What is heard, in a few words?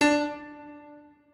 piano